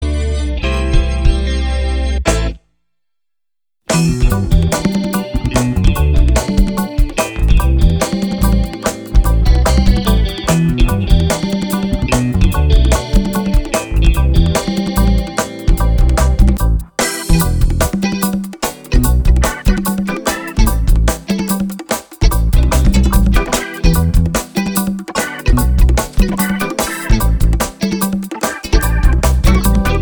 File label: African musk; Subatomic Party